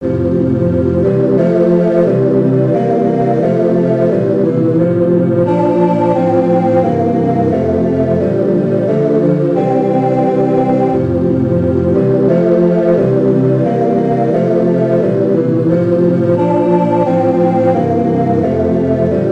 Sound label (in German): nationalhymne f dur ttbb chorpartitur